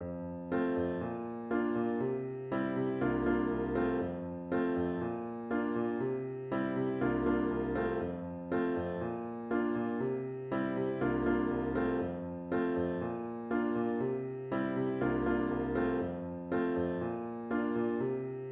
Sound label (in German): audio playback klavier